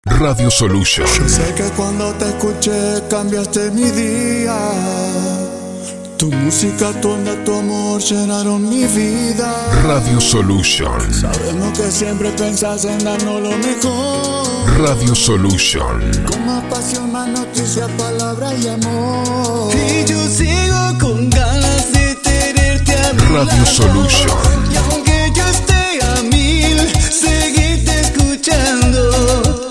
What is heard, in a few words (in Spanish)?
JINGLE DE PRIMAVERA